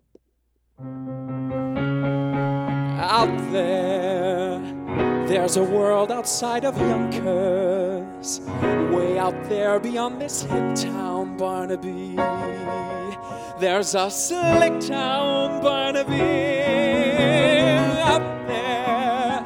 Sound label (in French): Chant